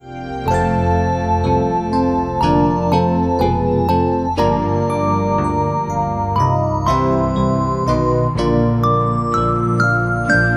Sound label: Instrumental; Christmas 2022